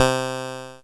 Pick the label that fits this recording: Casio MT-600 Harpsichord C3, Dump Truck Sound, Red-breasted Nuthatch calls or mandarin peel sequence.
Casio MT-600 Harpsichord C3